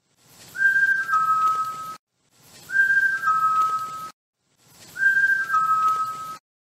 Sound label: Whistle; Negan; TWD